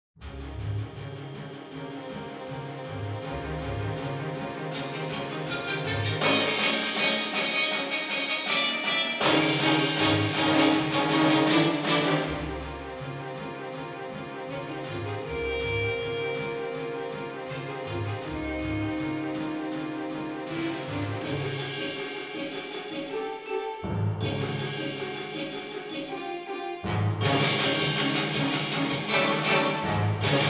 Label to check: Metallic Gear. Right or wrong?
Wrong